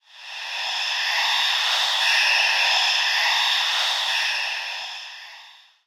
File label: heartbeat; superstriker